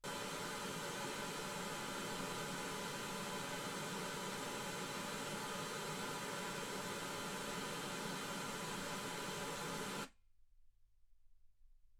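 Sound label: white gaussian noise 48kHz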